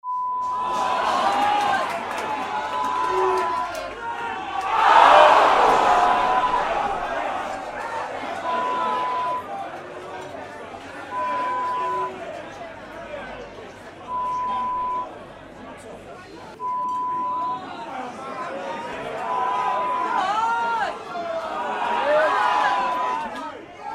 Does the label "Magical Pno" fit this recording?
No